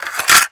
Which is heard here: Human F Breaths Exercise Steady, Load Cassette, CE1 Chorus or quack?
Load Cassette